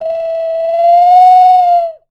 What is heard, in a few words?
bird calls, percussion